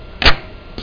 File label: KLICK; miro